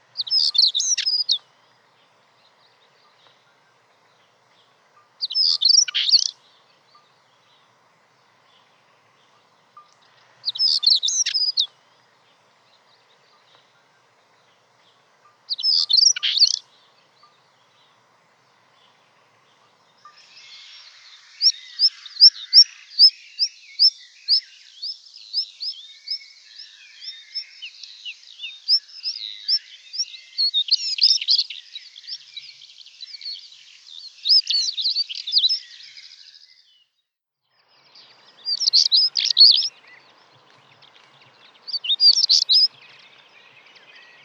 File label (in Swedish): svarthakad buskskvatta, fagelljud